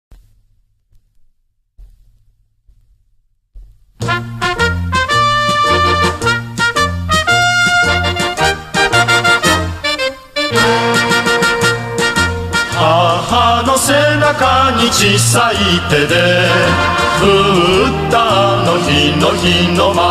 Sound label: Military music